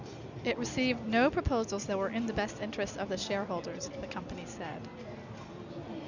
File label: babble